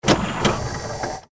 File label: machine scream